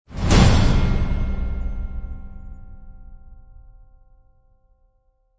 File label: Game Bell Whoosh; Gothic Storm Music